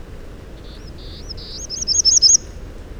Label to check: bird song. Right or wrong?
Right